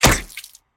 monster chomp; soundscrate

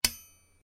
Knife Clash; soundscrate

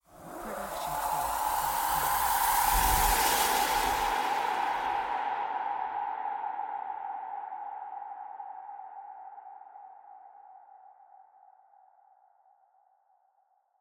trailer riser; soundscrate

